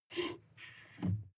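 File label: sports car interior pedal brake pump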